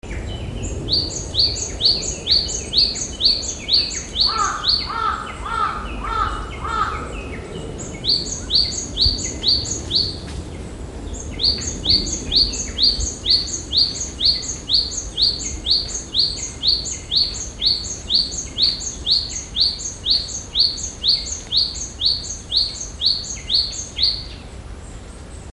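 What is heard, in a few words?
sijukara, Japanese tit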